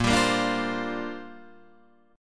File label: Bb 6th Add 9th Bb Guitar Open D